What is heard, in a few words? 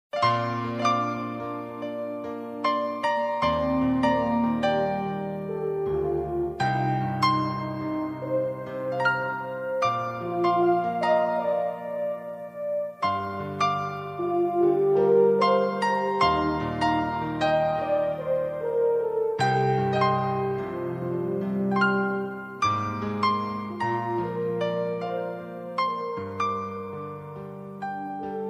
instrumental ringtone; bollywood